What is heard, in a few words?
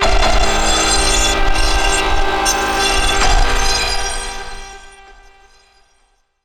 intro Action Boss; PunkGirl